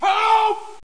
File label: discover civilian